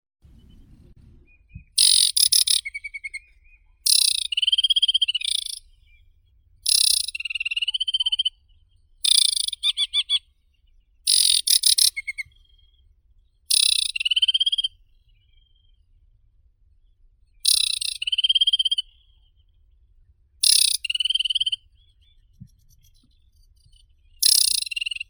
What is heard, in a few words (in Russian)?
kamishovka vertlyavaya acrocephalus paludicola